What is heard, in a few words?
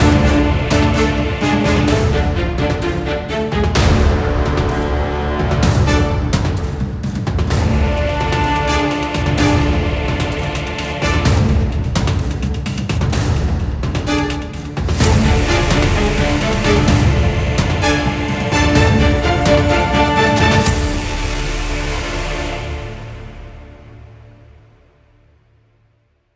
tension; by burak sound bank; tension gerilim vol 39